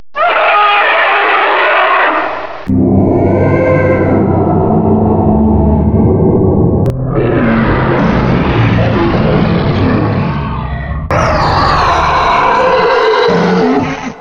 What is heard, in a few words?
Monster Scream